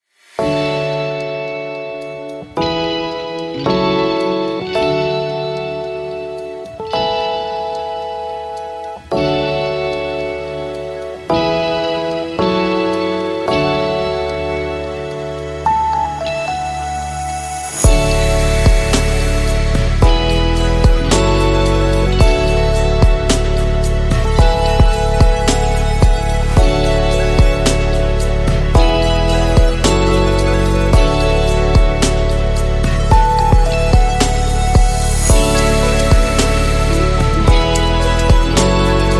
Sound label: Deep Emotional Abstract, ambience electronic music